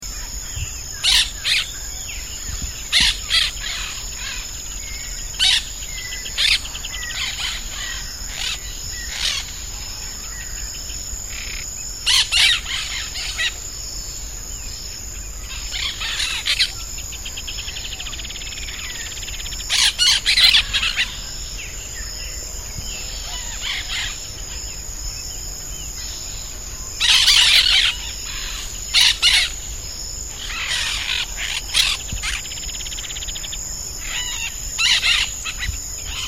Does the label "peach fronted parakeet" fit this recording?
Yes